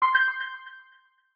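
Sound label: smart notification gym speaker; Sonniss GDC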